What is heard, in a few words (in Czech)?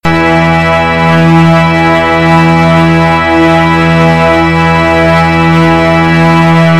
male e tuti, varhany; englerovy varhany